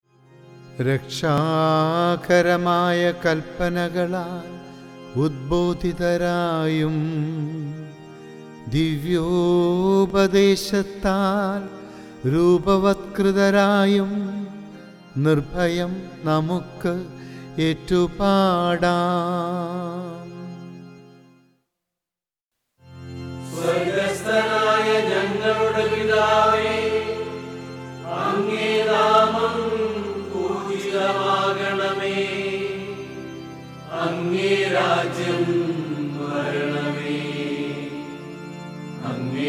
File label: Chant; Swargathil Vazhum; ordo cantus